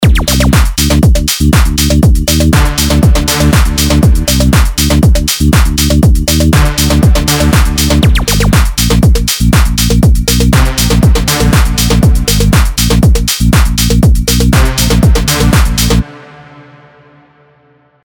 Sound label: Tech House; Nexus 3; ROM Extension 2